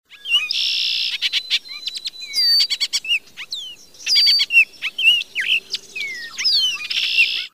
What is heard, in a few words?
Baltimore Oriole